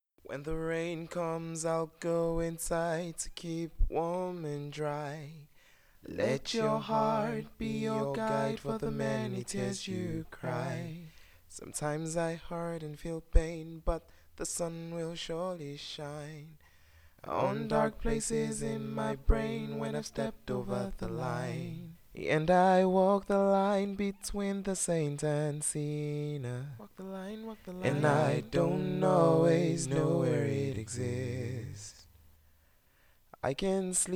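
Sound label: rnb pop D major 95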